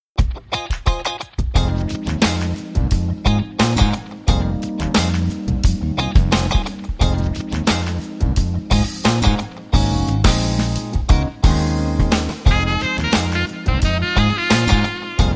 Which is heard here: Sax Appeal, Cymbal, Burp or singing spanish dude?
Sax Appeal